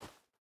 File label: footstep boots dirt jump start; Rust